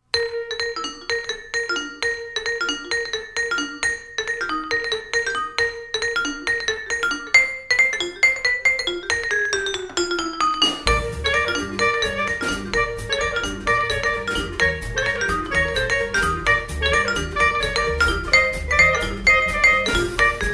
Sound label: bugle